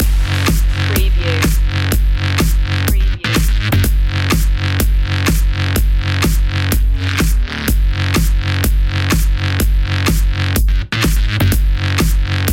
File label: phunky beat loop